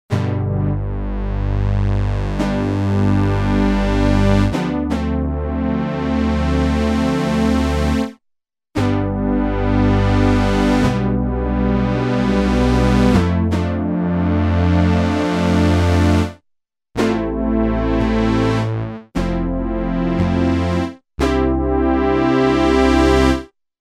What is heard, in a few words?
crescendo brass, Korg DW-8000; Factory Patches